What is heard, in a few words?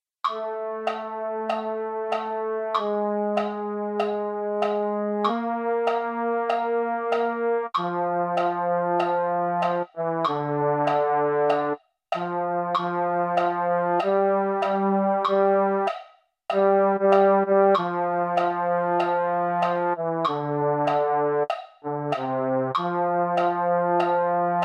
baritone, MIDI; Euphonium 1